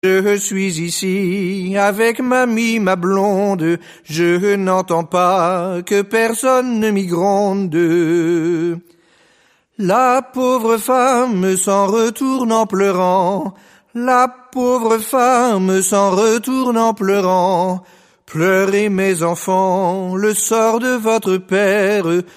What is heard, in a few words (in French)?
chants du pays de questembert